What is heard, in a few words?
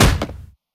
punch